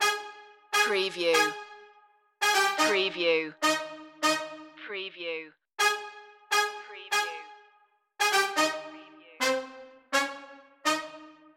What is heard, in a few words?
a new day has begun loop